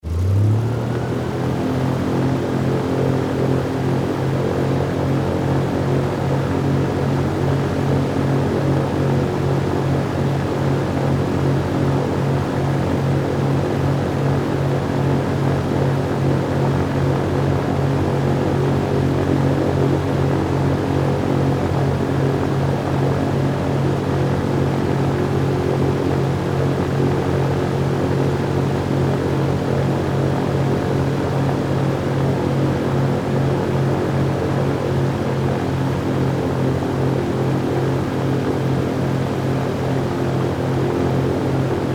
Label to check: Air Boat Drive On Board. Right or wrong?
Right